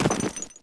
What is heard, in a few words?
player snow